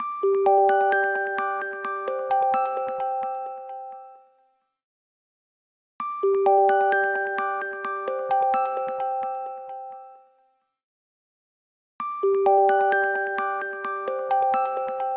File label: Schedule Appointment, ringtones; LG; Wing 5G SKT